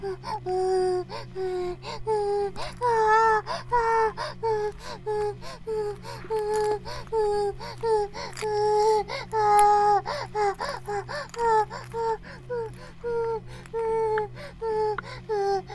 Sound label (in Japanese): バイブ放置; イクたびにわけて